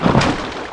slosh; COMBINE

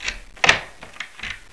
door unlocked

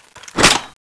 mk46 cover up, weapons

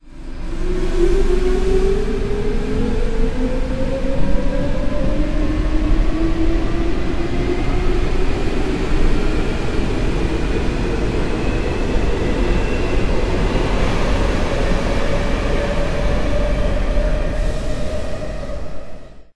train start

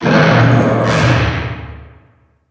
alien

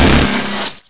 laser end

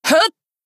jump

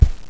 dirt

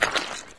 foot on mud

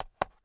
step

